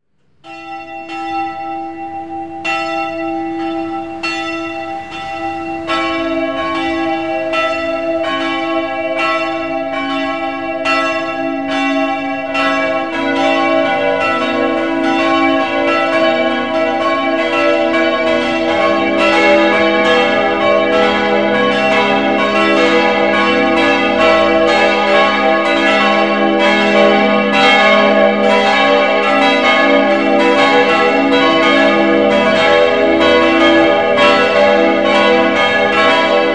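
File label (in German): glockengeläut; glockengelaeut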